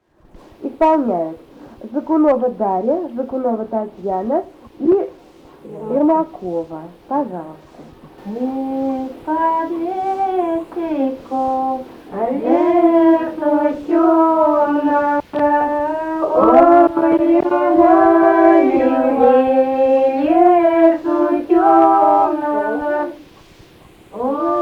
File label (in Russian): карагодная; Лобановка; Из-под лесику, лесу тёмного